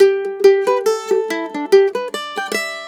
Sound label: MANDOL 140, Celtic Flavours; USB Soundscan vol.42; AKAI